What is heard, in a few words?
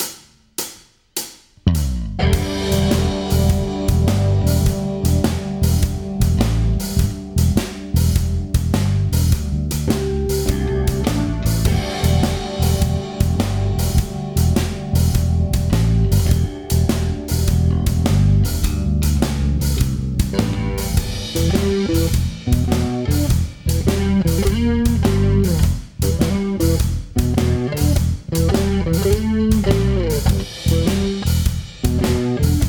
Triplets and Bass